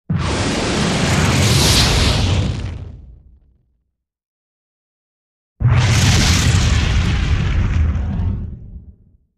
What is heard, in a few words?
Fire Space Fireball; fireballs